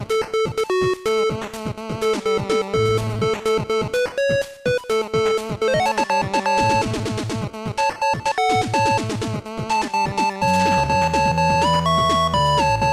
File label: 8-Bit